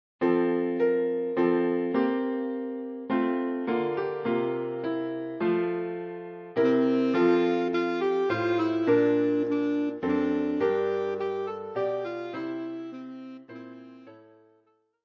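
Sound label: We wish you a Merry Christmas, alto saxophone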